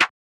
SN, Snares

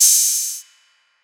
Hat, Open Hats; [ACD] - Strippers Hat

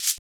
Percs

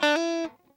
Stabz, FX

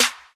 Snares; JJSnares

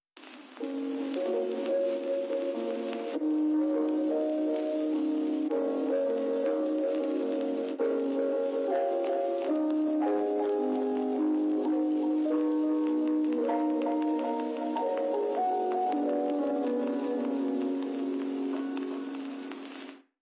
smpl melo

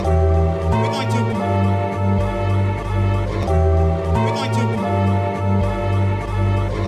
Sample; BackYard

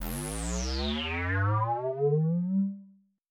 TRAP TRANSITIONZ

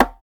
Perc

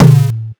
Kick

